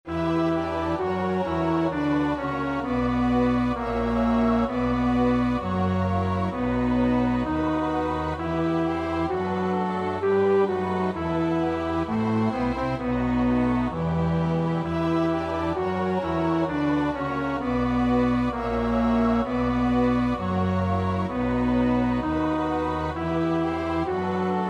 bas, koorpartij oefening; Bach (Johann Sebastiaan); BWV 004; 8.Wir essen und leben wohl

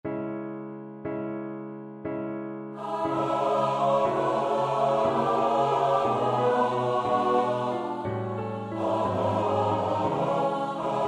S-A-T-B, koorpartij oefening; Fauré (Gabriel )

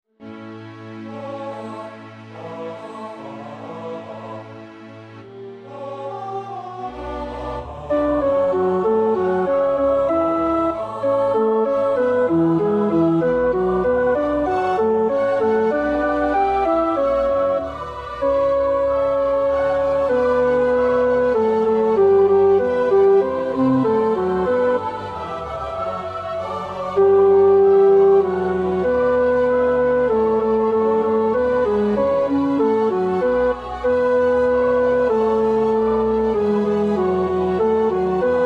tenor, koorpartij oefening; Bach (Johann Sebastiaan); BWV 245 Johannes Passion

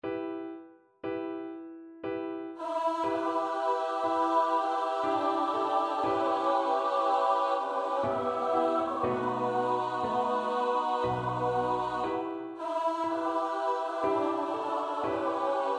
bas, koorpartij oefening; Fauré (Gabriel )

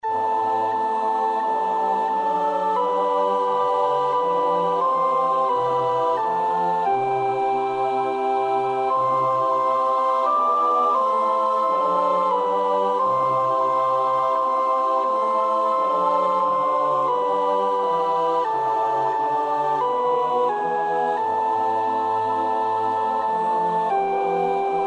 sopraan; Bach (Johann Sebastiaan)

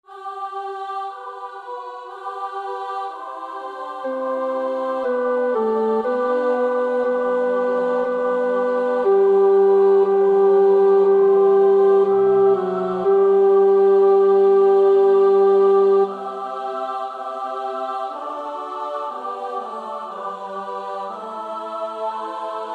tenor; Röntgen (Julius); 6 oude Nederlandsche liederen

